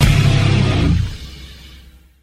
guitar dropped

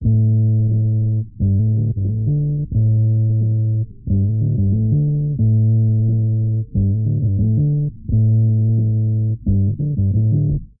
bass new york shitty; Loops; Phonky

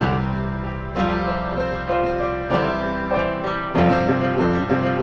piano, loops, phonky

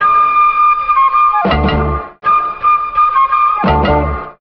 flute, Loops Phonky

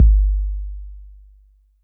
kicks heartbeats

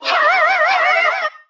ha SUNG WAVY, Voice; ooh ahh

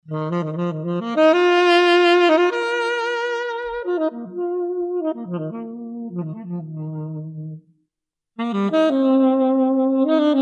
mysteria sax

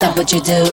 what would you do, female SUNG; questions

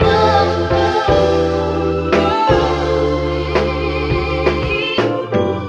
keys, Phonky; loops

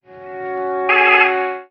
stirring, loops electronic

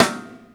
har, snares real

